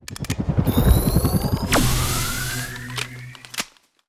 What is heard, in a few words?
Hunting Impulse; starbites